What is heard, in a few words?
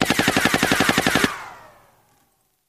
Machine Gun Fire Rapid Burst With Bullet By Whooshes; single burst